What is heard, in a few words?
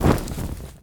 Flamebearer Shoot